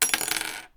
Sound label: drop metal thing